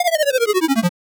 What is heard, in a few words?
arcade game over drop; pac-man